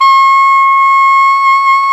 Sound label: SAX ALTO MP, Alto Tube; Roland LCDP07 Super Sax